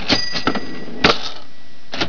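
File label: cash register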